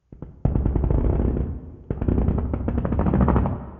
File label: spooky ambient sound